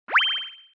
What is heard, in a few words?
Skitter, notifications